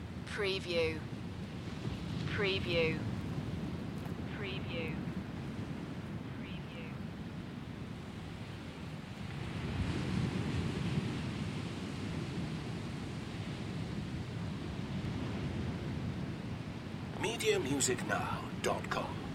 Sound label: Very Smooth Ocean Surf Loop